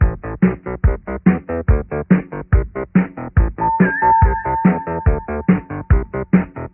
arcade madness, Electronic Loops

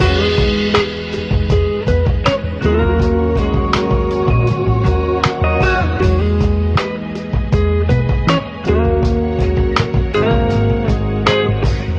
guitar, Loops, Phonky

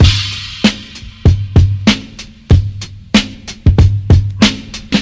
Drum loops, Boombap

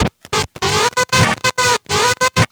keys cut up music, jazz loops

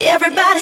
everybody SUNG

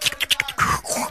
chikkity chikkity shoo hoo, Voice; ooh ahh

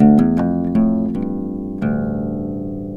guitar, Jazzmatazz loops

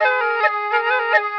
flute, Jazzmatazz loops

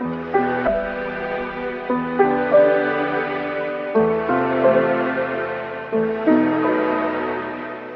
piano, Sad Loops

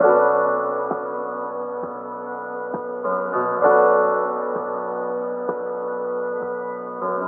piano, sad

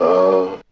distant and vexed, Voice

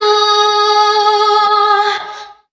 "new", Voice

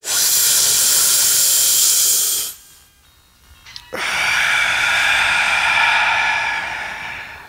inhale and exhale smoke; ooh ahh; human

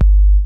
pi squeak, Heartbeats; Kicks

